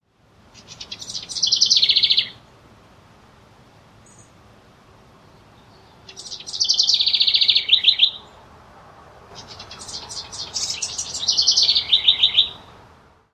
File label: House Wren